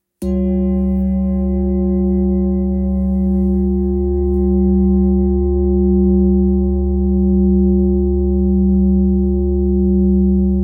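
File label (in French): Bol ramgandi do diese, bol chantant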